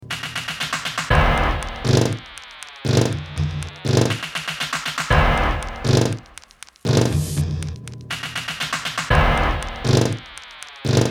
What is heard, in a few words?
Atmosphere Anxious Ticking Percusion with Nervous Static Ambience